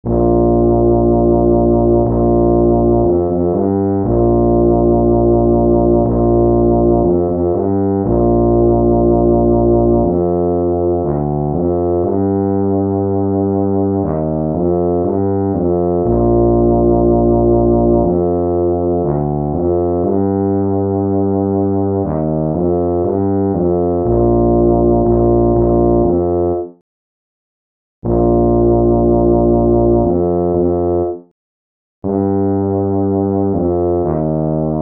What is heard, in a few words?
tuba